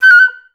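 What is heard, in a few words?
flutes F#5; Best Service; Peter Siedlaczek's Advanced Orchestra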